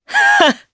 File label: Laugh, jp; Morrah; kingsraid; voices heroes